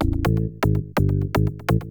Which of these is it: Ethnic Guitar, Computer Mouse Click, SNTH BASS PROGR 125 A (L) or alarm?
SNTH BASS PROGR 125 A (L)